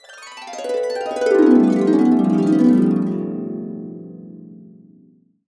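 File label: A CASCADE DOWN, Harps; USB Soundscan vol.62; AKAI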